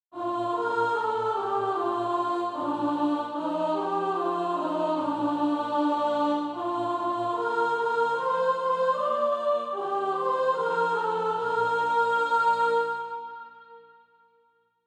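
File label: soprano, Practise Music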